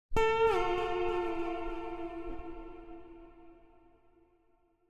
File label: Chime; chime sharp